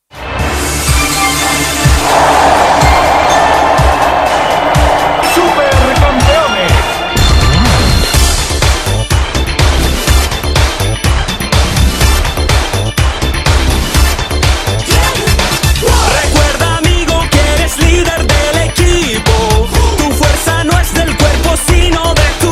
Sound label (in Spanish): mundial musica